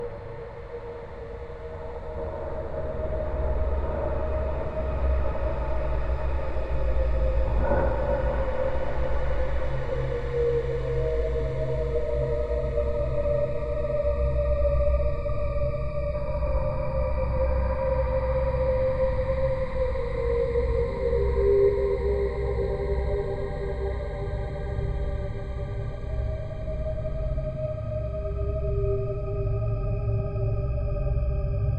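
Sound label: Music Ambient; Toren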